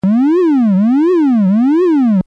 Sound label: lfo tri; nord3